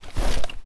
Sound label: IED Release; zps; Weapons; Explosives